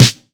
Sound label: good pop snare sample b key